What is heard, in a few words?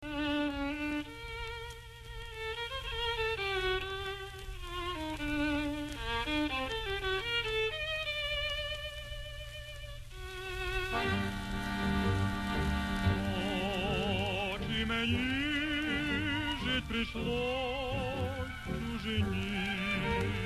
Ukrainian tango sad song